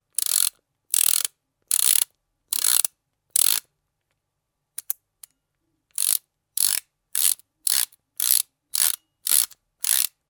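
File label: ratchet sound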